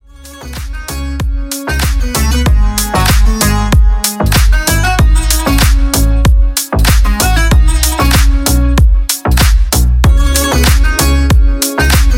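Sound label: beautiful Arabic club music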